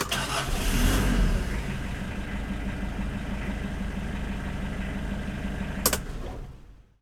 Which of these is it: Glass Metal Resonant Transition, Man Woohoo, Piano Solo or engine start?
engine start